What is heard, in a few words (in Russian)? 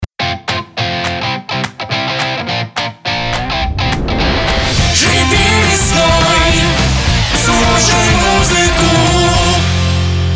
Джингл Пропевка Живи весной